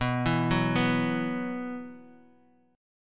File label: B Suspended 4th B, chord; arpeggiated; Bsus4; 19,19,16,16; JGuitar